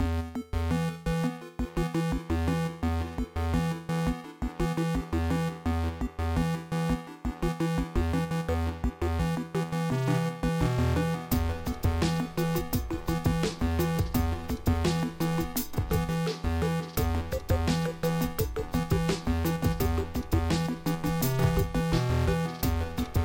minimal robot music

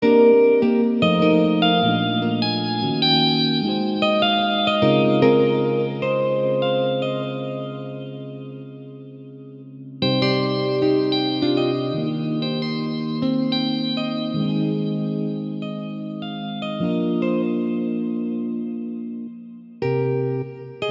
jazz pop

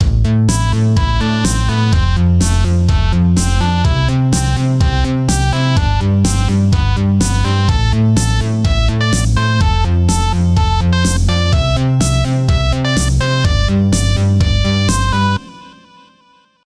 Italo Loop; DikkoDikko

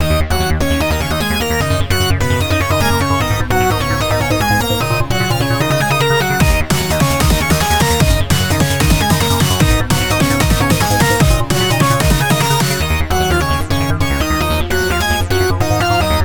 racing tecno

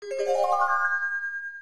magical

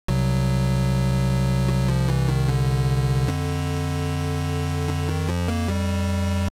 slow drum

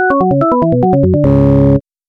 win and lose melodies basic lose

